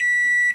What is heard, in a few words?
Microwave Beep